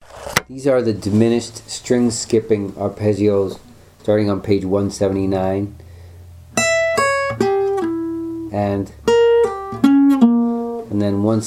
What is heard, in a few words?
string skipping diminished arpeggios